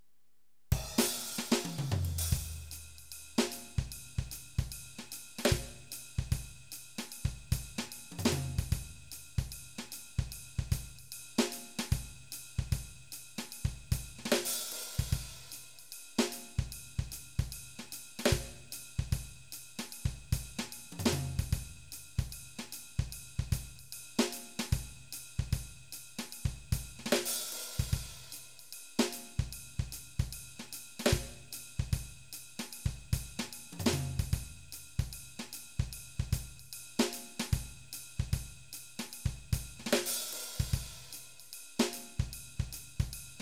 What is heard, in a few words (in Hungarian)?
jazz blues kiseret alap, gitár